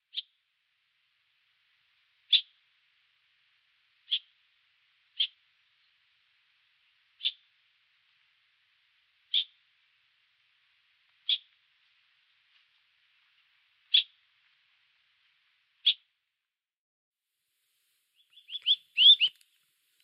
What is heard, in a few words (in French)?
chant oiseau